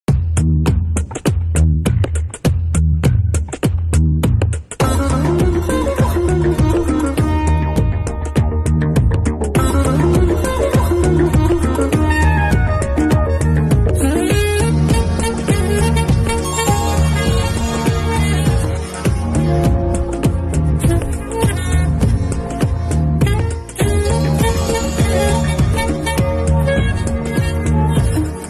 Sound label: love bgm; ghajini